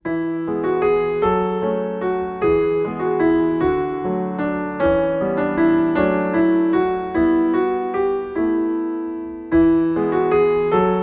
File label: Kind Waltz